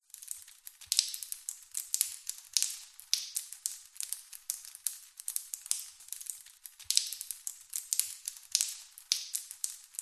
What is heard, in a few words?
household shower drips tiled wet room light room reverb; zapsplat